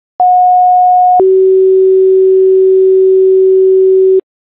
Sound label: fire marshal, tones